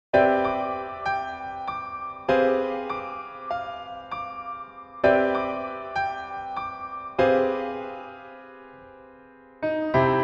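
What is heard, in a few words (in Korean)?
Piano version 멜로디MR 여자키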